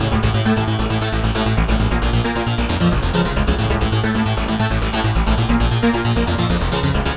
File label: Bass, Synth Bass Loops